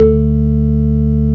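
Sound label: organ D2; Best Service; Advanced Media Trax Modern Composer; DANCE ORG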